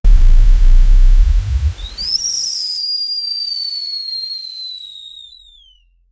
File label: compressed air release wi; dart